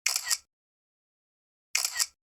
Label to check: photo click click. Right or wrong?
Right